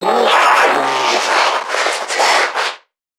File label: Creatures Vocalisations Infected; soma; npc construct; Five Nights At Akers